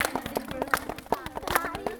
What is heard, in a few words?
120 CLAPS